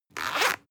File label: zipper open fast; objekte; reissverschluss